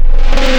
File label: Turbine, wavetables; Cymatics; Outbreak Wavetables; Serum